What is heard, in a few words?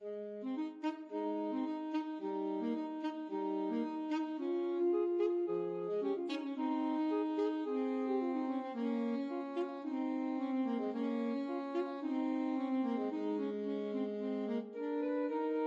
alto saxophone duet